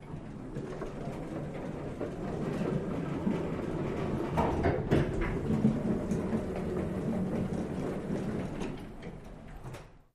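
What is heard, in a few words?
Elevator Movement Start, elevators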